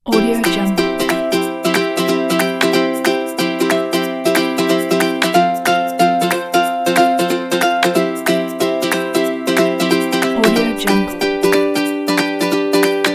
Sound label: happy travel